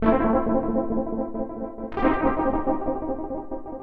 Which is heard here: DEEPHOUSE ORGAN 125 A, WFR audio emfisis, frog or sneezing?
DEEPHOUSE ORGAN 125 A